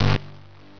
Astrology error

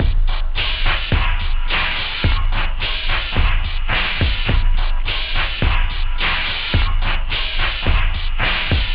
stomp, techno; OctaMED SoundStudio V1

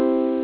right ans

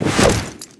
pistol whip, weapons foley; Spearhead Demo; MacFormat 2003 June

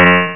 Bhoom; Drop fight 2.0.3

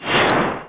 slag; battalion

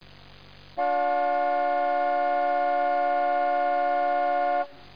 car horn; Broderbund Print Shop Multimedia Organizer Deluxe Edition

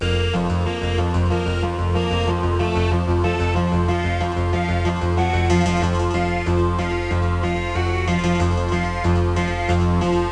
LOOP

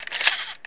servo; BESTSHOT; noisybit

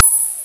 TV Off; Glider PRO

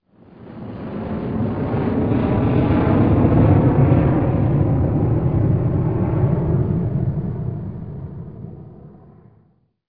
airline pass; SpecOps2MPlayerDemo

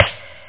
hall stick; sticks

